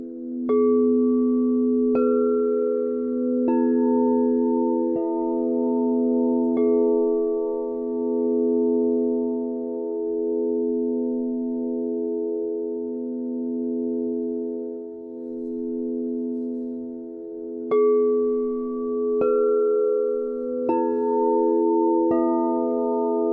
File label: Soft Breeze